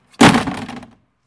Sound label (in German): tuer zuschlagen